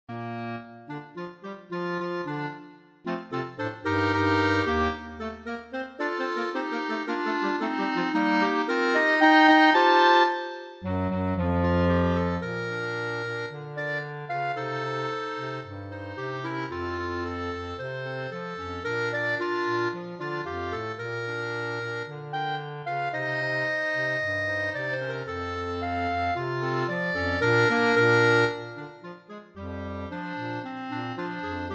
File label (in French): cha cha quatuor clarinettes